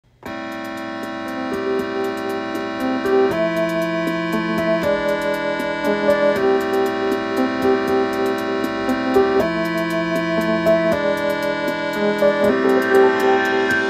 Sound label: Omnichord